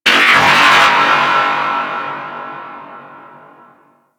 Creature Robotic; Ambience; Warframe; Corpus Outpost

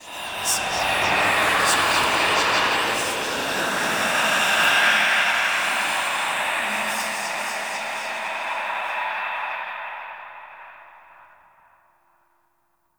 Temple Pillar Whispers A; Warframe; Ambience; Orokin Derelict; Red Veil Temple

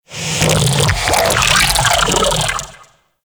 Sentient Vocal Attack

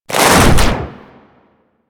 Ice Swing; Warframe; Grineer Hammer Boss

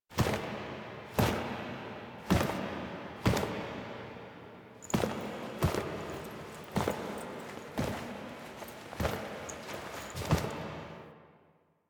Foley A; Drifter; Warframe; Quests; The New War; Narmer Outpost; Chamber Archon Intro

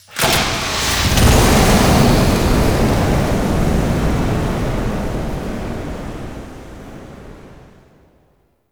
Nokko Visions Flamethrower Start; Warframe; Nokko Colony

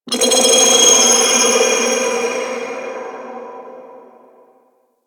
Clicky Texture; Ambience

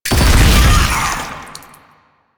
Proto Infested Maggot Explosion

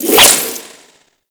Whip Whoosh; Grineer

